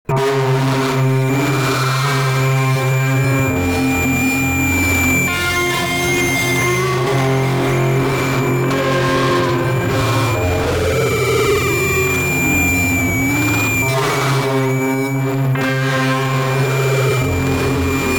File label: Gtr noise set